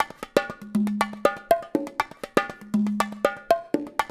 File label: loop, bongos